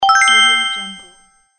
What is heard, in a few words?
quiz correct